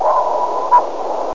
Sonar Pings; Techno

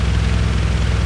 Diesel Tank loop; A-10 Attack! Cuba

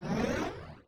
ring wind; Lord of the Rings The Fellowship of the Ring CD Cardz Gandalf

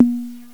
Analog Tom, drums; GMPlay; XG

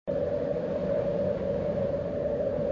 Wind Normal; moonstone madness

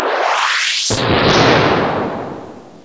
Victory; 3D Ultra Pinball Demo

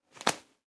place bread; Immortal

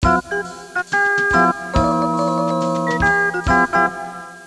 hammond; PUPPIES

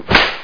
WHIPLASH; NOAH

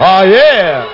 aah yeeh, speech; Terra Sound Library

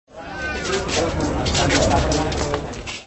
Small Crowd; red cross eru

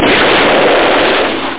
rocket; TOADSDemo

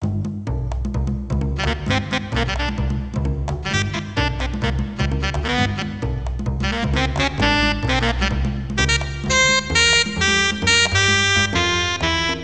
band

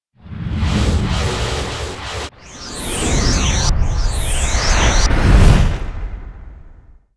skill attack; FantasyTennis

